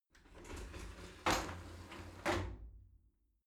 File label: shower slide door open soft